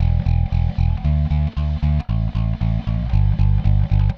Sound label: Bass, Indie Rock; Sonic Foundry (Sony Creative Software); Indie Rock Anthems; Session 06 - Haze